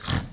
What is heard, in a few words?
piglette oink